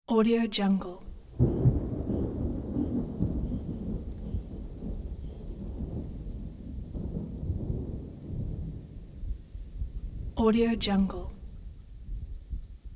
thunder

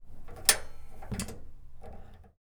stove clicking

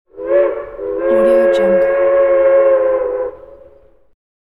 steam whistle blast distant

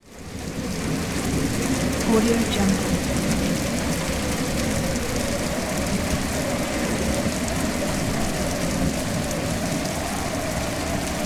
autumn breeze

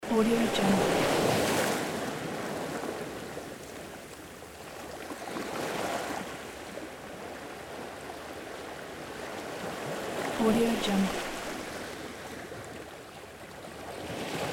beach waves

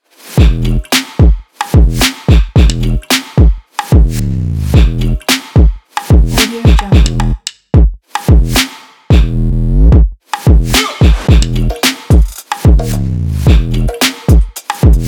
dynamic sport pulse

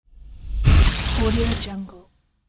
electrical zap

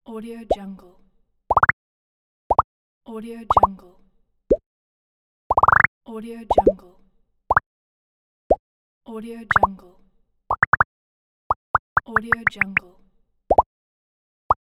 bubble pops; cook-sfx

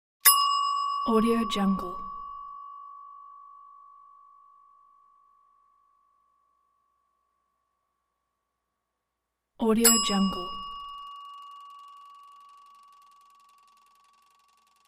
desk bell

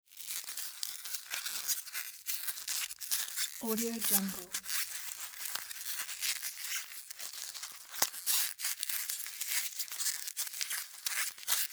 styrofoam continuous squeaks

